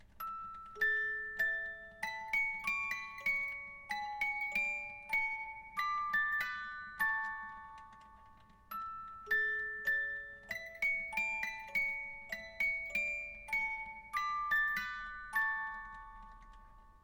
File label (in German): Hymne Netherlands